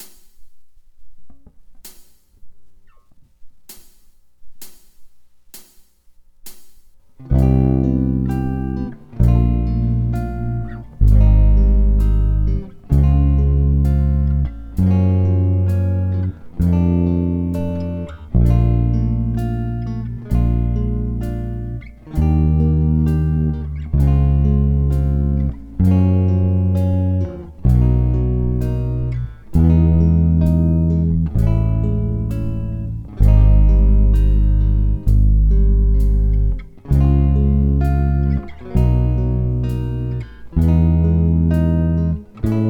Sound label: backing